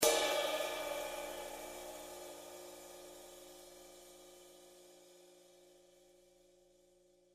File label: 20in Custom Left Side Ride, cymbal sound